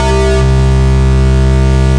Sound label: Lead, Synthesizer Middle C Notes